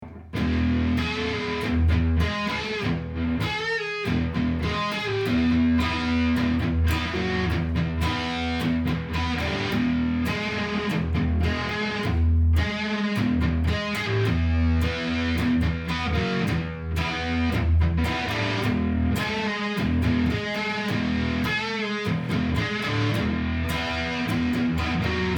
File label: Instrumental; Orbiter